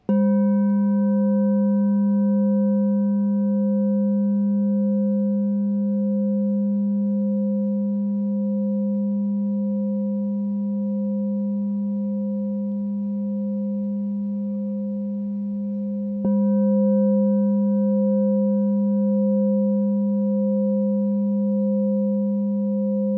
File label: LOW G +5, crystal bowl